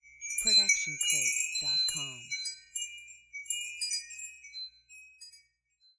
Wind Chimes B

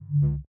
lightsword swing swipes; soundscrate

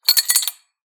coin drop metal tray; soundscrate

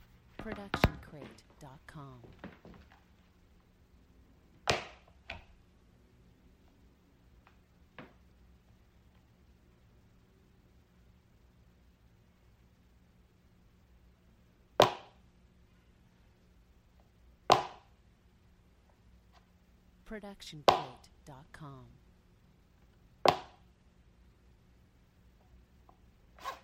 footfall heels; soundscrate

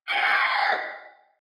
dino screech; SoundsCrate

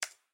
Computer Keyboard Single; SoundsCrate

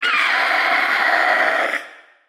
raptor screech; soundscrate

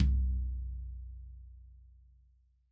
tom floor; soundscrate

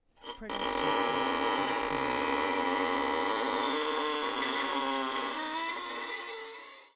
Creaky Screetchy Noise Long; soundscrate

